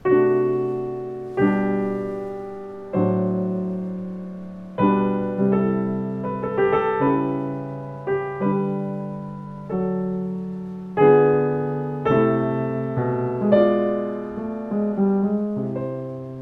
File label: Schimmel C213, piano